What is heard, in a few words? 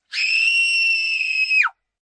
Scream Shrill, Scream, 1 child, shriek, high-pitched, human voice, kid; Screaming; VoiceBox